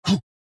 Punch

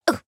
AHurt; Harley

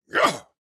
Attack Medium; Black Adam

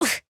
AHurt; Harley

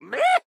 Evade

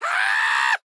Stripe Charge

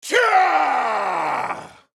Attack Extreme; Black Adam

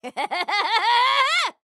Charge

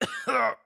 AHurt

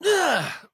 AHurt; Jake

